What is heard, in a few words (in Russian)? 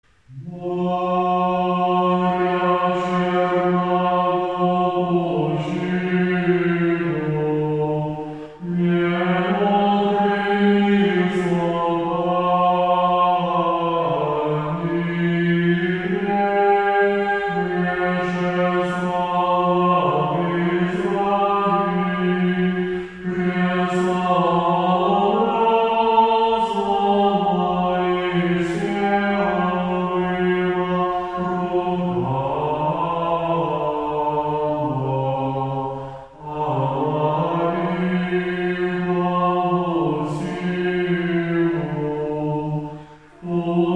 4 глас. Ирмос 1 песни; Моря Чермнаго